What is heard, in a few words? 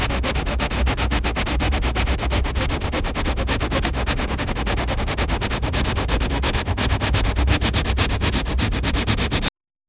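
sandpaper scratching metal; abrasive; SFX Engine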